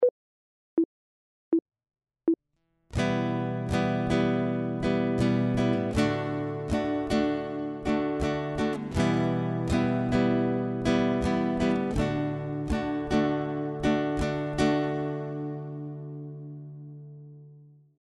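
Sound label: chord, guitar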